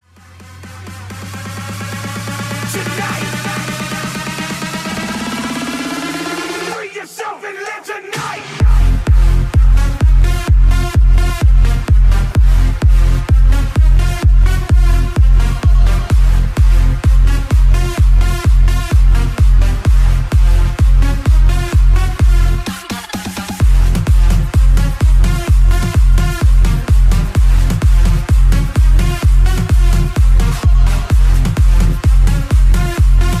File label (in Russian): narastajushhij gromkaja narezka na budilnik